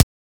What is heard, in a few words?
Weapon Punch SMG